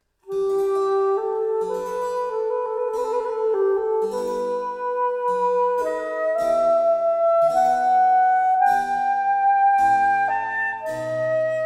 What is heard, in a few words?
Sarabanda in E minor; Handel G.F